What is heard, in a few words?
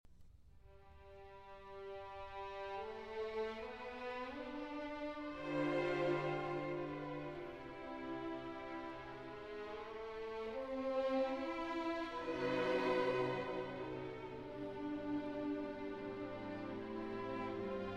Serenade in E Minor For Strings II. Larghetto